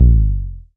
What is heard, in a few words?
Tom MKS, Drums